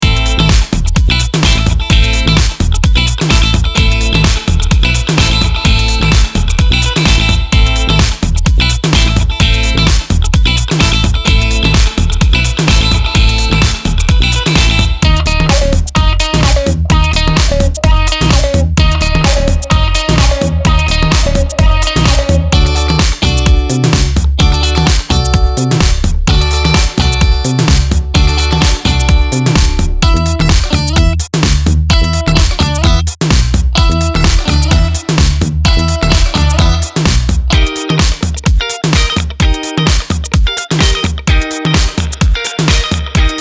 GUITAR HOUSE; MAFIA

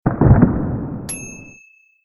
cannon

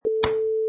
Face Slap

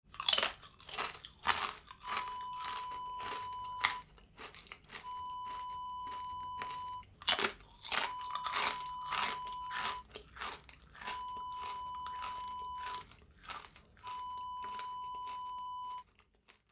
crunching

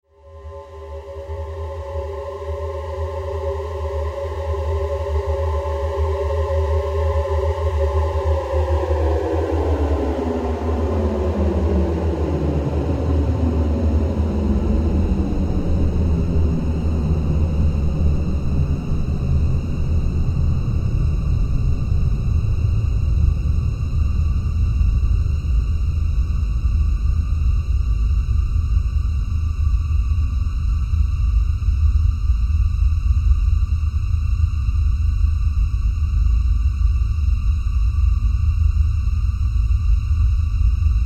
Sci-Fi SFX

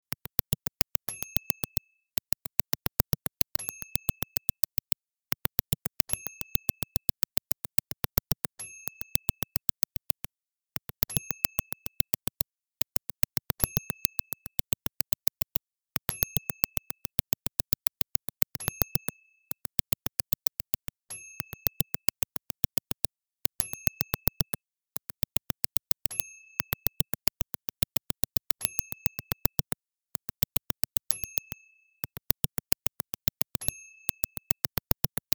woodpecker

